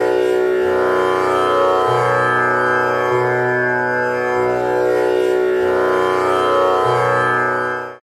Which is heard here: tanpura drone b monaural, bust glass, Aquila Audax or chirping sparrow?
tanpura drone b monaural